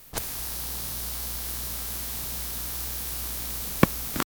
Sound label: VHS tape inserting with, retro